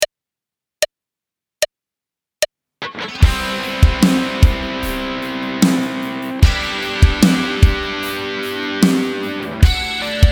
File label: Tapping Ex Minus Bass